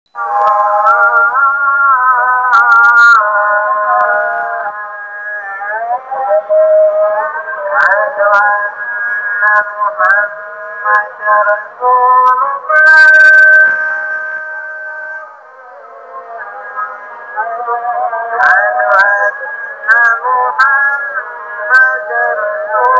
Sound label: muezzin